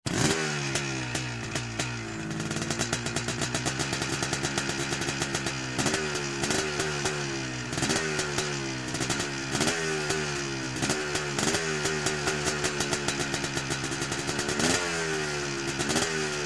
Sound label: Cross motorcycle starts, regassing, turning off; motorcycle racing